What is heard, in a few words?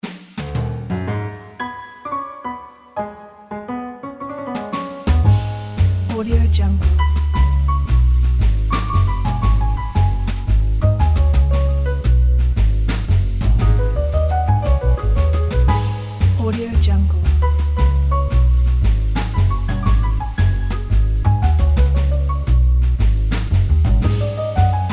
lounge piano jazz; keensonic